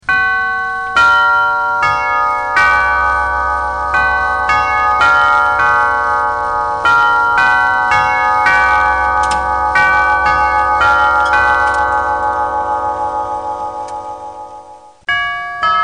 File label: tubular bells